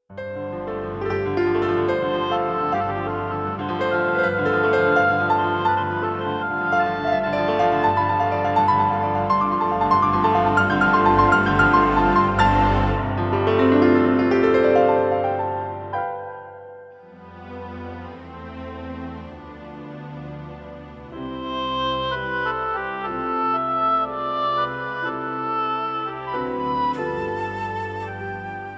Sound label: Piano Concerto in A Minor